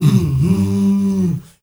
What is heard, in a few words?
MALE HUM, M. HUMMERS